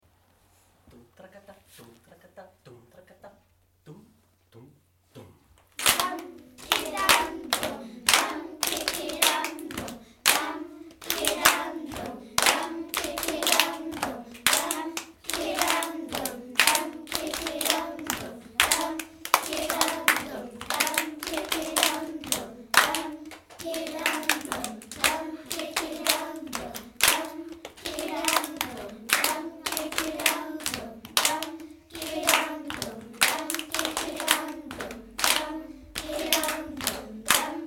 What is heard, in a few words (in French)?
percus